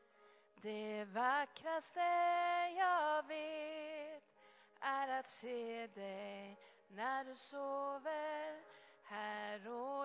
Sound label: tenor